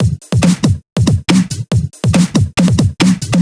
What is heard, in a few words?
Break Beats, 140 BPM